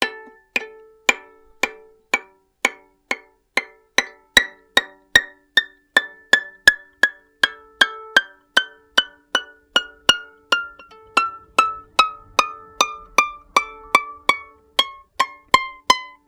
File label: Violin Extended Technique